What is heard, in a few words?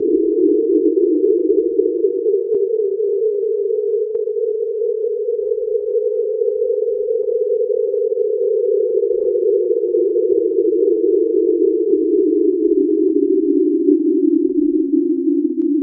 Carrier Wave, Environments; Sonic Foundry (Sony Creative Software); Myths of Technology